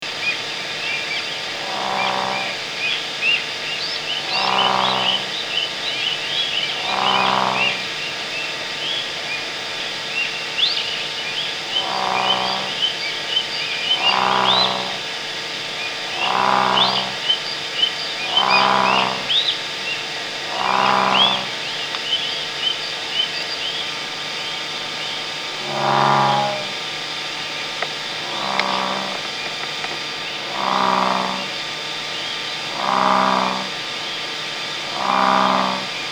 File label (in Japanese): kaeru